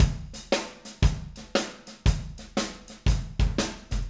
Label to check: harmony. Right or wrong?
Wrong